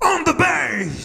HEY VOX, Roots Disco; USB Soundscan vol.32; AKAI

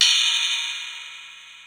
Dance Ride, Ensoniq ASR-X Pro

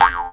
jaw, Didgeridoo and Other Primitive Instruments; Big Fish Audio - Didgeridoo and Other Primitive Instruments; JAW SIMPLE

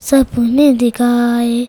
CHILD VX C, Indian Traditions; USB Soundscan vol.45; AKAI

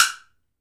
woodblock; Africa

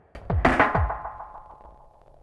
drbreak, drumbreaks; Masterbits; Vinyl Attack

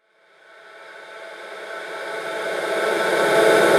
Reverse Vocals A; Vengeance - Essential Clubsounds Vol.4; Effects

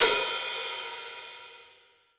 Rock Open Hi Hat, Roland BK-5

